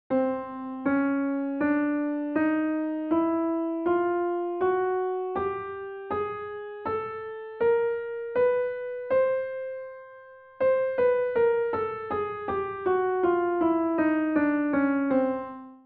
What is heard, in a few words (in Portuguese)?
escala cromatica